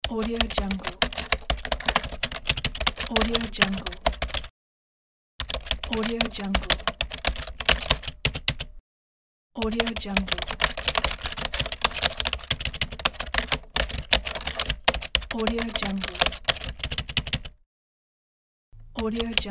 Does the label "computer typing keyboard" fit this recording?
Yes